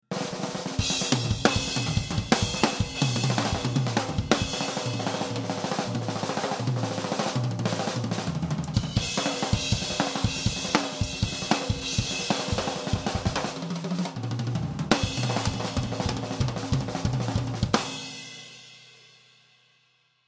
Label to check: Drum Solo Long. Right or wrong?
Right